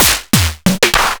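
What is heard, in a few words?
ms20 processed snares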